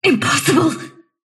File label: sylph death; dota; dark willow